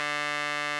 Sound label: RMI; Sonic Reality - Vintage Timetraveler